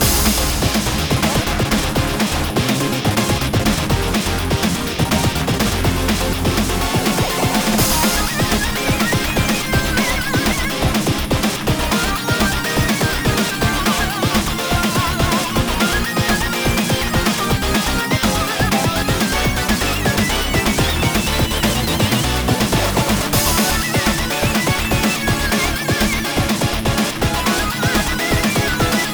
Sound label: Challenge stage Loop